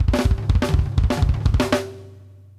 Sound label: AROUND TOM, 120; Sampleheads; New York City Drumworks VOL-1